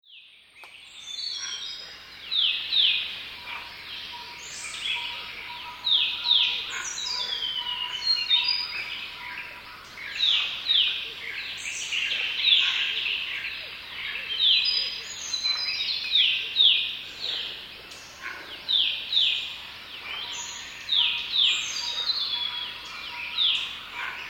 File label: Thai Rainforest